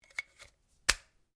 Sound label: RIFLE CZ 452 VARMINT 22 CALIBRE MAGAZINE ENGAGE; A selection of domesttic firearms; Rifles